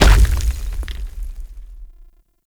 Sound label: Infested Femur Step Default; Warframe